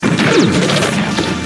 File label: thermonuclear sounds; godzilla